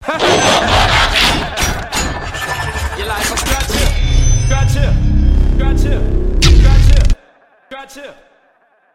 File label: super cat hahaha